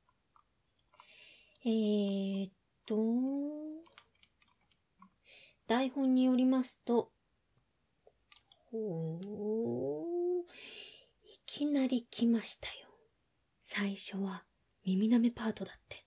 初めての耳舐め

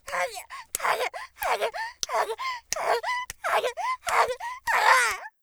はぎゅぁ; 効果音あり

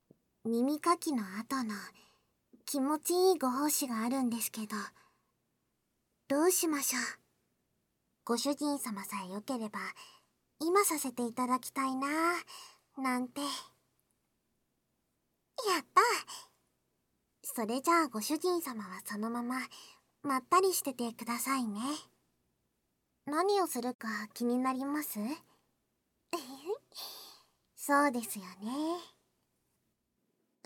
耳舐め手コキ（バイノ―ラルマイク収録 効果音あり大きめ）, ASMR; バイノーラルマイク収録 効果音あり; 効果音大差分

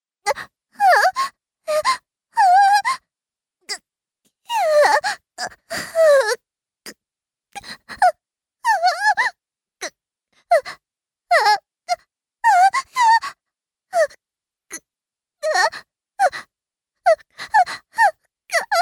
喘ぎ抜粋集; 実用ボイス抜粋集

